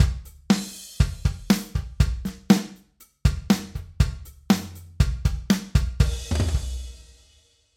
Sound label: Drumloop Brick Drum Room Brightness Modulation